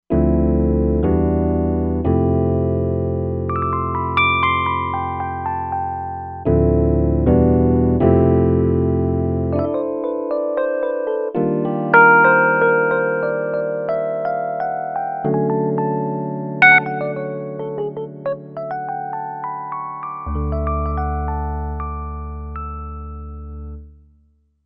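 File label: Suitcase, synth piano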